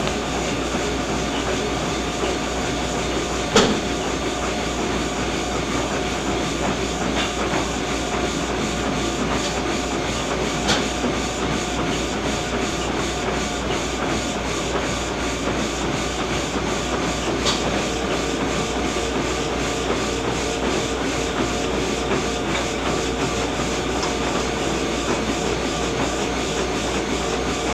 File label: treadmill